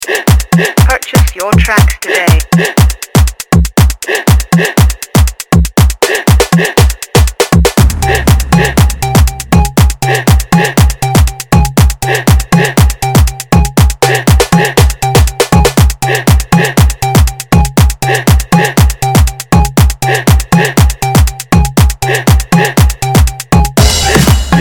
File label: Afro Dancehall Instrumental